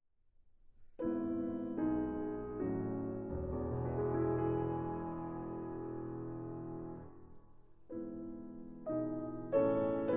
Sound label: piano Improvisation; Concertante